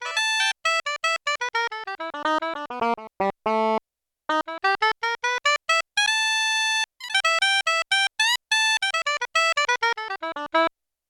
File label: Soprano Sax; Alto & Soprano